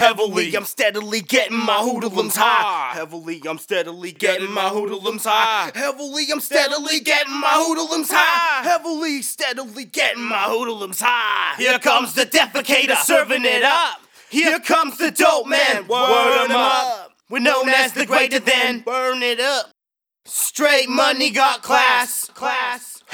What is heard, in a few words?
acapella 83bpm